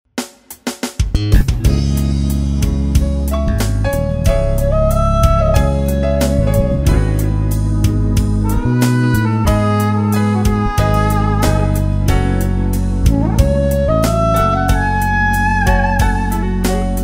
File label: sax